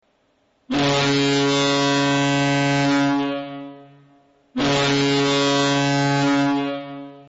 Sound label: Horn New; Bruins